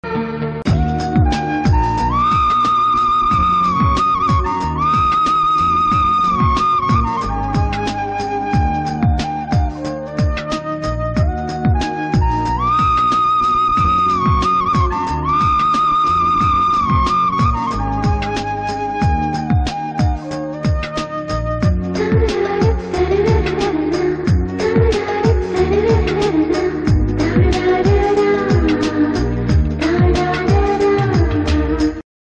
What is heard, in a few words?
classic bgm; Panchadhara Chilaka